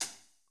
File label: hihat, lofi hiphop; Legendary lofi hiphop